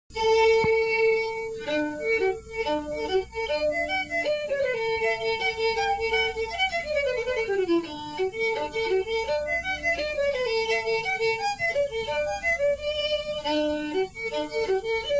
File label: hornpipe fiddle; harvest home